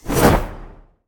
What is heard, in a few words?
basic swing; smite; thanatos